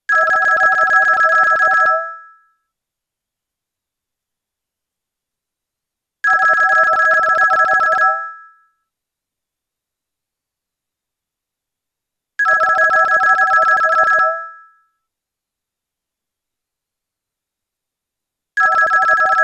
Old Swedish Phone; old phones

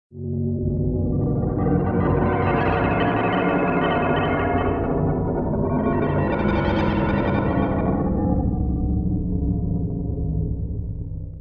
Sci-Fi

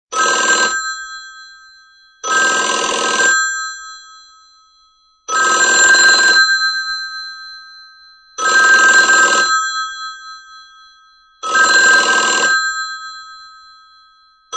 old bell

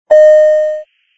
airline 1 ding, ringtones

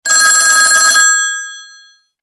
vintage, ringtones

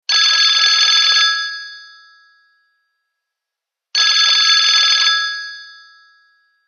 vintage; old phones

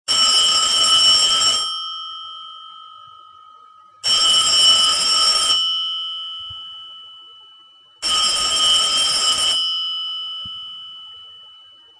Rotary Phone, ringtones; old phones

